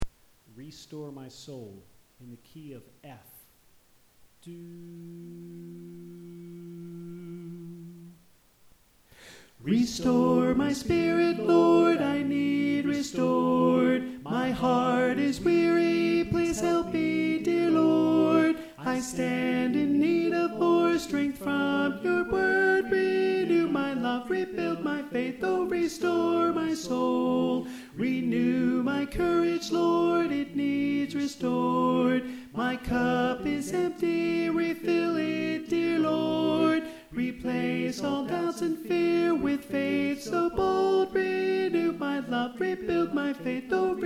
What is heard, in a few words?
Alto